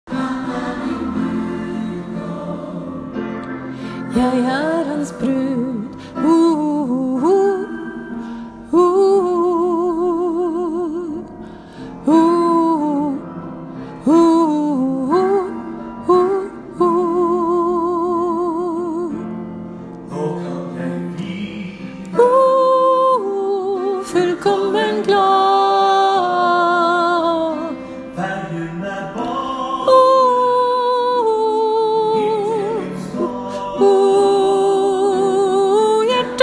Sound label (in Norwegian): sopran; åfjord